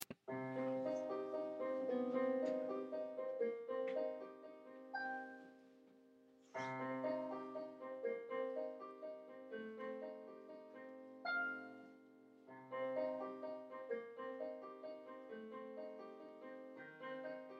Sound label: piano solo